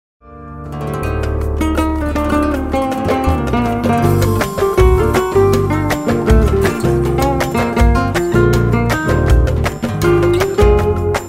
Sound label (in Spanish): Falseta, Flamenco